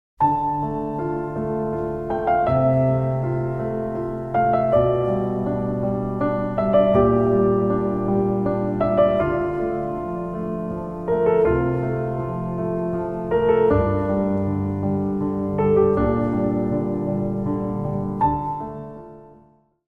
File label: cadences